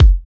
Kick, Kickdrum; Equipped Music; Loungin House

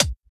Hi Hat, drums; Sonic Foundry (Sony Creative Software); Drums & Drones; Construction Kits; Within Kit

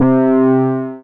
SYN ANALO, dance; Sound & Vision - Gigapack II

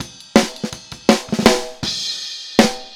Fill Snare, Drums, 162 BPM; Drums from the Big Room The Mixes; Take Your Toms Off

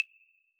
analog claves; AnlgKit1

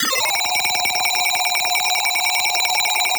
bass loop sustained cruelty; Virtual Riot

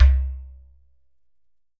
GHATAM; Q Up Arts; Denny Jaeger Private Collection

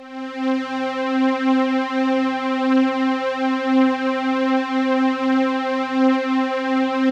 STRIN, SYNTH, Trance; AMG - Global Trance Mission VOL-2

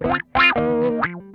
GRAINY WAH, Funk Guitar; Zero G - Funk Guitar

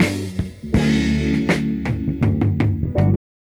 Band Fill 081 D, Lounge Lo Fi Break Fillz

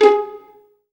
STR Pizz 3 G#3, STRING; Best Service Gigapack 1; STRING 2

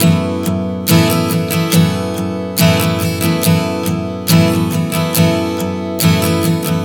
Strum 070 F#m, One Chord Strumming 070 bpm; Acoustic Guitar; Sonic Foundry (Sony Creative Software); Songwriter Acoustic Guitar Companion

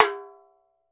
TAMBORIM, Brazil Drum Perc; E-MU Producer Series Vol. 7 – Old World Instruments

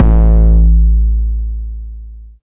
909 BD Long Tape Sat; Goldbaby Tape808 & Tape909; The Tape 909

